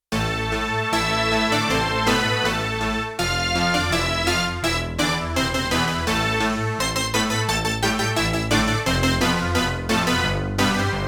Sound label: Bass Stack Split; Rock Performance 2